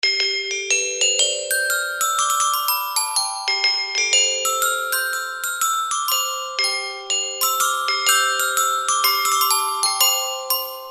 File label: Glockenspiel